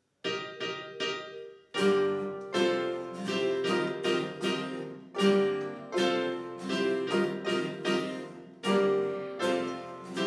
orchestre; festival

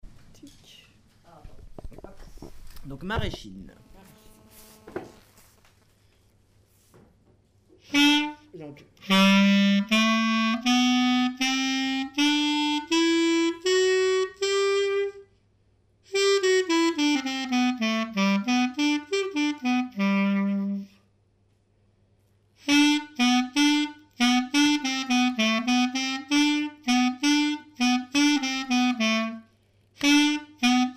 maraichine, clarinette; stg clarinette

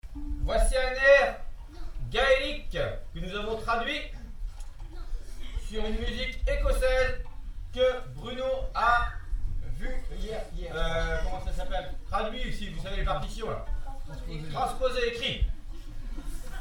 air gaellique violons; charivari

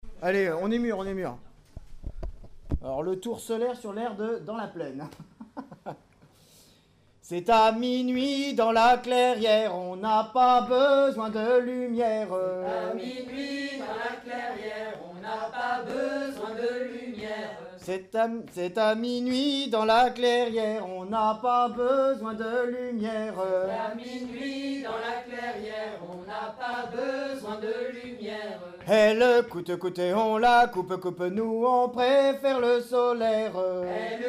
tour la clairiere chant; stg clarinette

